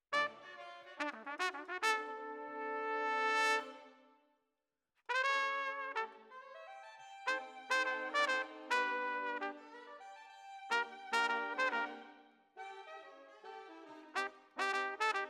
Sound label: Trumpet